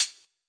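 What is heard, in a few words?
classic hip hop hi hat; STYLE KITS (Hip Hop, Etc); Classic Hip Hop Mega Kit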